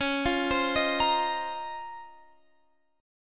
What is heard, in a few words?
Db Major 13th, Guitar Open F; arpeggiated